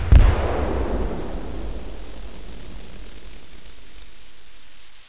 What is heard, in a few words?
BLASTING; homm2